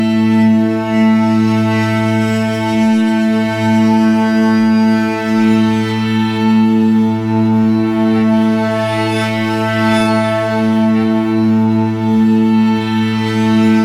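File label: music temple chord; Shangri La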